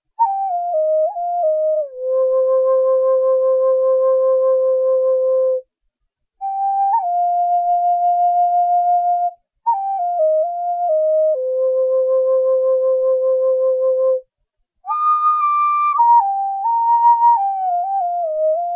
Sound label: Medium C Eastern Cedar, flutes